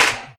soft hitclap; osu!

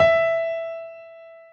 drum hitwhistle; osu!

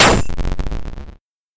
soft hitwhistle; osu!

